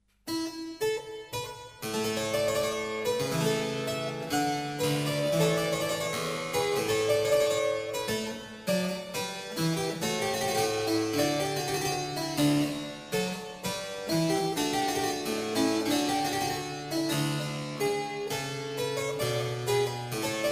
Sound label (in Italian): Capriccio, harpsichords